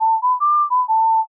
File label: matsuri fue